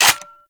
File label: Heavy Shotgun Cock Start B; Warframe; Tenno